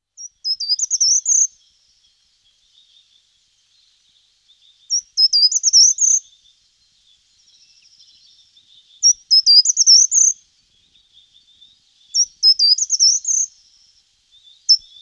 gartenbaumlaeufer, gesang; singvoegel; roche 2004

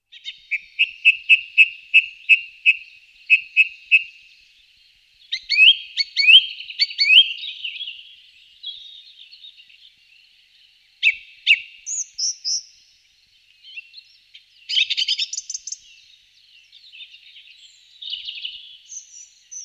singdrossel, gesang; singvoegel; roche 2004

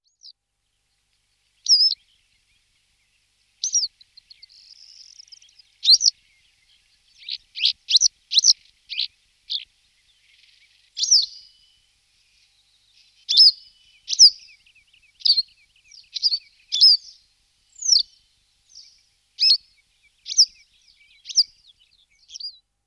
schafstelze, gesang; singvoegel; roche 2004

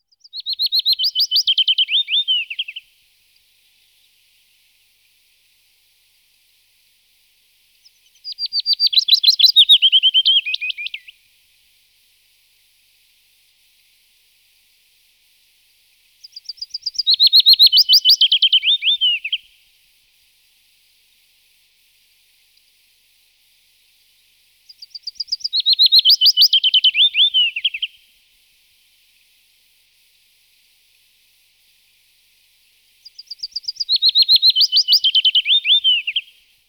fitis, gesang; singvoegel; roche 2004

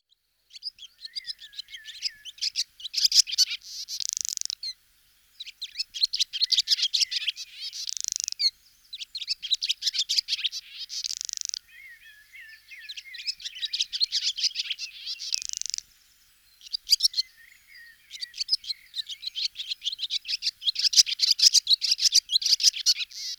rauchschwalbe, gesang; singvoegel; roche 2004

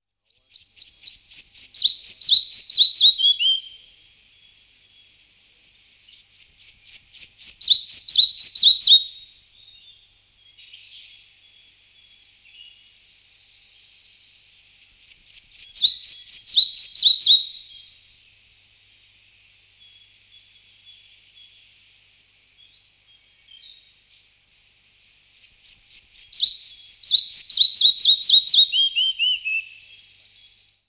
trauerschnaepper, singvoegel gesang; roche 2004

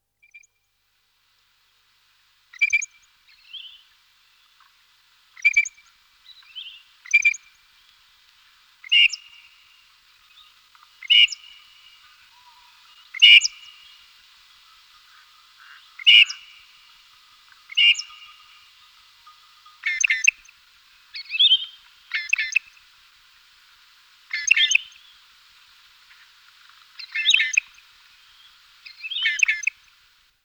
raubwuerger; singvoegel; roche 2004

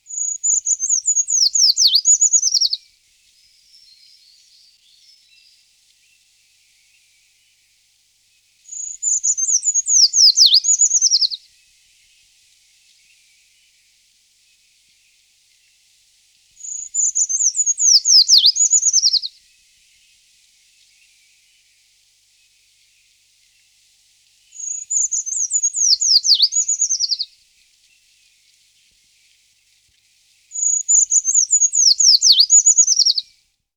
waldbaumlaeufer, gesang; singvoegel; roche 2004

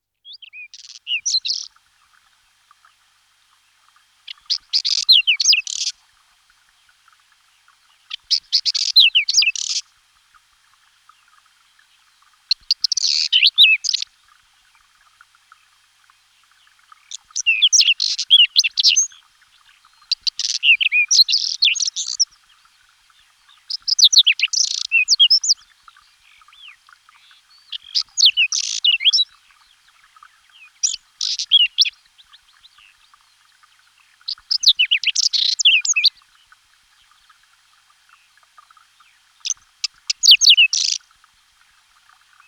braunkehlchen, gesang; singvoegel; roche 2004

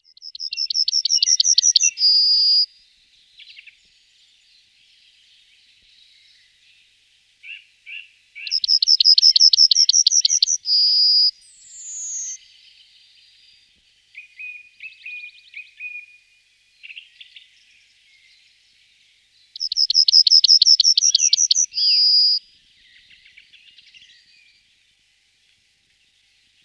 goldammer, gesang; singvoegel; roche 2004

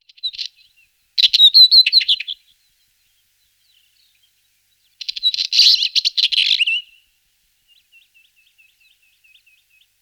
steinmetzer; singvoegel; roche 2004

